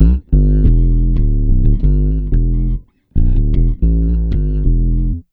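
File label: E minor, Bass, 90 BPM; Sampleheads; Ultra Freakin' Bass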